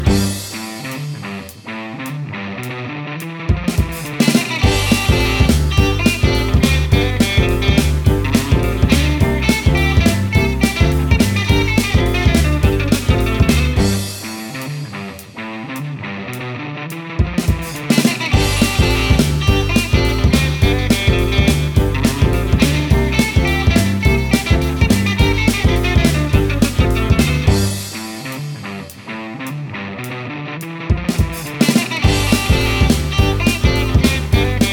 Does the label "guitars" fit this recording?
Yes